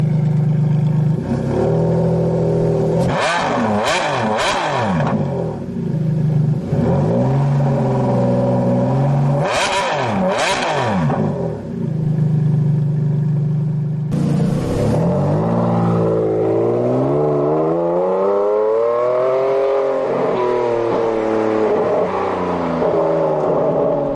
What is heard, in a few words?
ferrari enzo